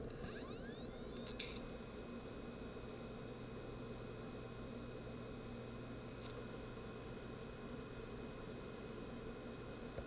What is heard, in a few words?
hd sounds, laptop, bad; mac disasters